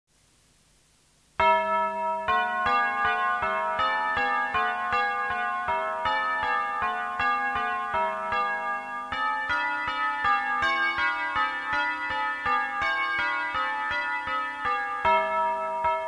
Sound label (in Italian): Canone la campana; canzoniere